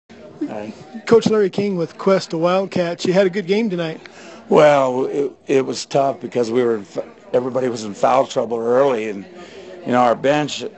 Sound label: Cowbell; Questa boys